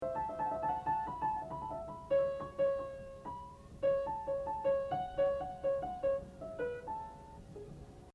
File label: Piano